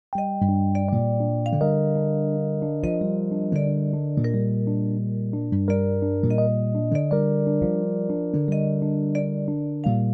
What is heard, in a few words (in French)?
Piano électrique